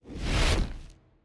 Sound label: Golem Ice; Legend of Keepers (gamerip) (2019)